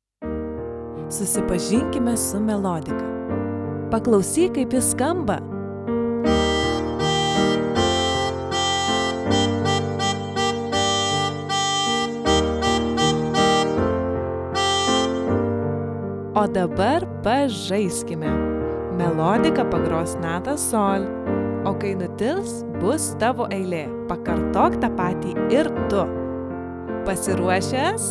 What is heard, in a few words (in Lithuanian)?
Melodika; Susipazink su instrumentu